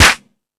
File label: Dance, Drum Machines